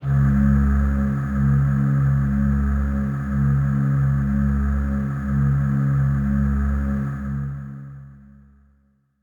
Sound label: Voices Kawaii C#1